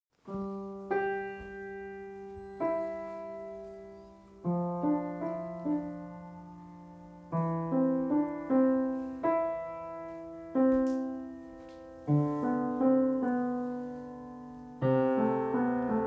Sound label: 100 year old piano; Beale